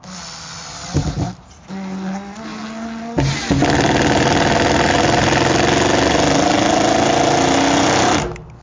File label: impact driver